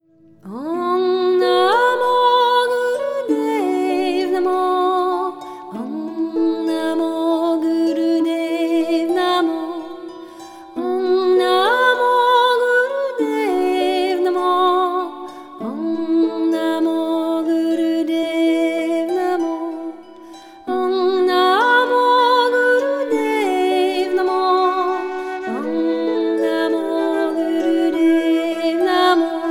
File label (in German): Ong Namo Flöte